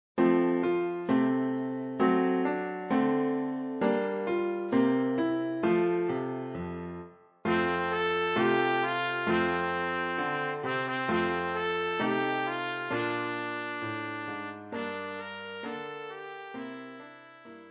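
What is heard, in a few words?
trumpet; Jingle Bells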